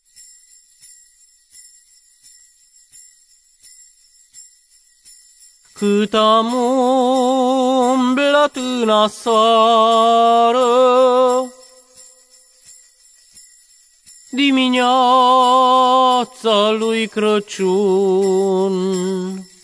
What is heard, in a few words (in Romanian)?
Colinde; Dimineata lui Craciun